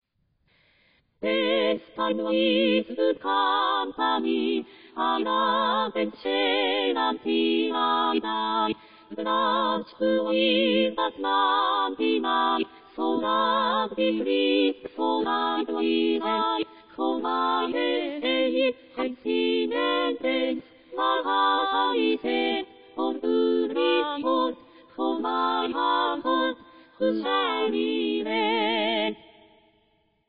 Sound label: 3 voices; 第61回 高槻市民音楽祭